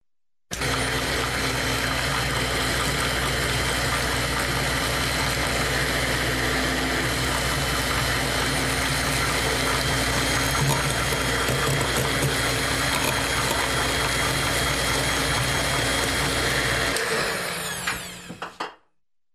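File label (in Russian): звук миксера пуск работа остановка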